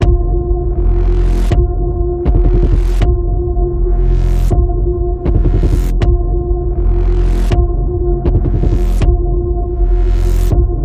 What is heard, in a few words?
Ominous Chase Panning Pulse F, Key of F